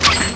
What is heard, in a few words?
knife draw; svencoop; scshogo